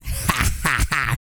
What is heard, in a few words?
GRUNT